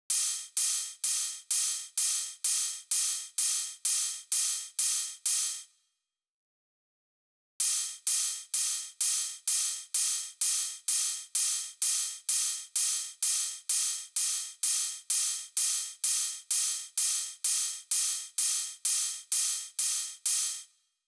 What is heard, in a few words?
128BPM Ride; Vengeance - Essential Clubsounds Vol.5; Blue Eye Kit; Root G; Drums & Perc